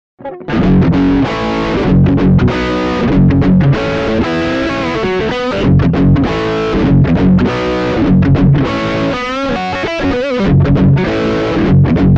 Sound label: Neck Drive